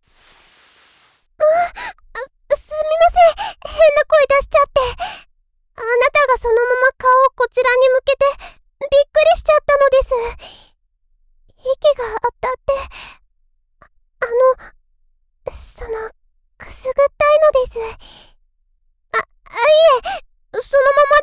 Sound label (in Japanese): こちらのお耳も綺麗にしますね(左), ASMR